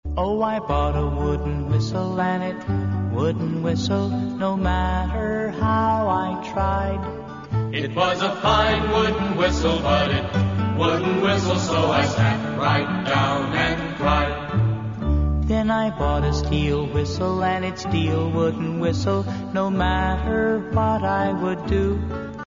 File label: whistle